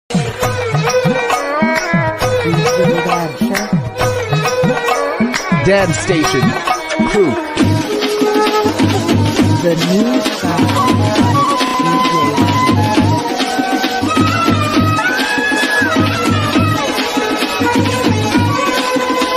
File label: movie bgm remix dj ringtone